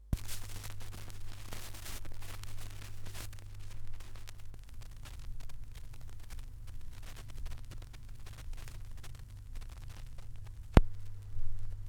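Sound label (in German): schallplattengeraeusche, record noise; wendelrekords 1.1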